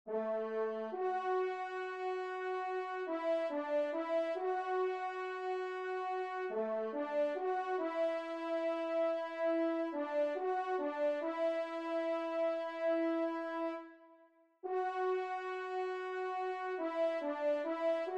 TRP; Vert Galant

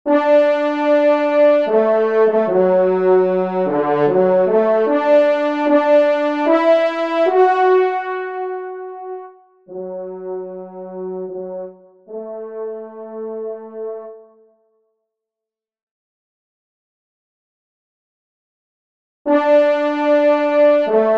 trompe; Nymphes des Bois; 1e Trompe; Victor Viney

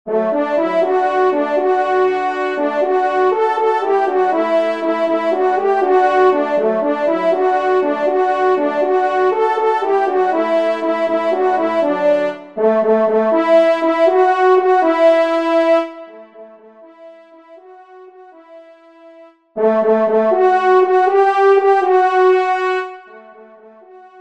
trompe; Rolle; Retour de Chasse; Emile Rolle

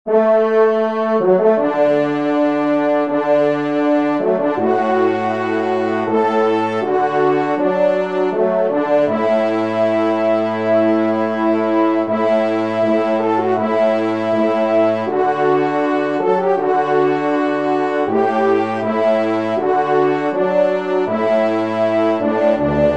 Entree, trompe; Devert; Entree 1e Messe

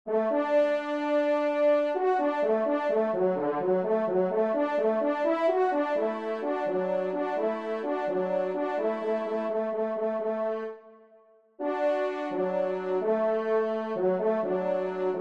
Marche TRP; Marche Maurice Valery; Auteur : Maurice Valéry; 2e Trompe

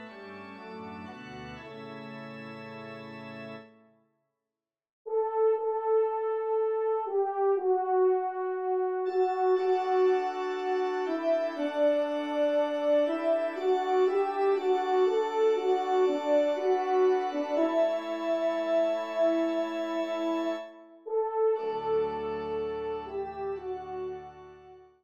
Elevation Trp Org; messe cantin

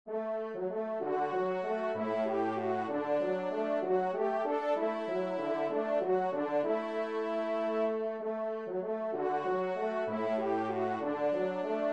trompe; Chapelle Saint-Martin – Moulins-le-Carbonnel (72); 3e Trompe

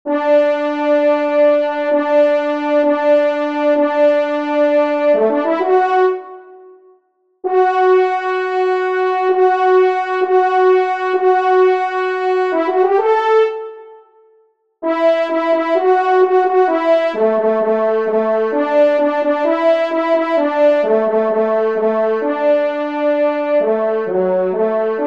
polka, trompe; Polka Inedite; Ermeneux; 1e Trompe

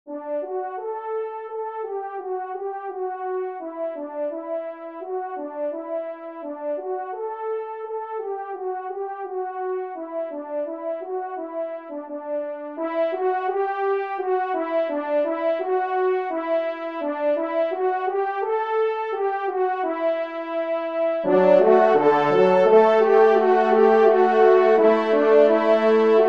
trompe; Pastoureau et Pastourelle; Victor Viney

